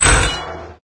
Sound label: Door; MapleShrine; mkxp; gameasync